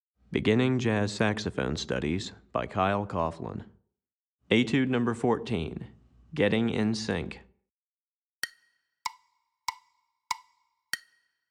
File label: Piano Part, Beginning Jazz Saxophone Studies Alto